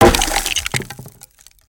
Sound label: Crates n Boxes, destructibles